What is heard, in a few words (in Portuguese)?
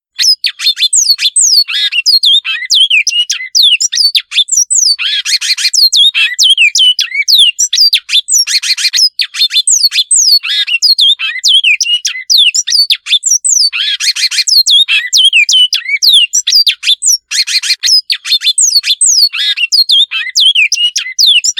Canto Bicudo Mandela